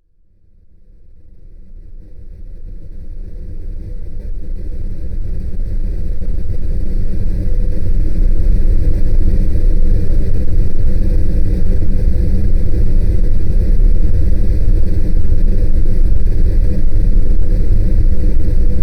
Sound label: Red-Brown Noise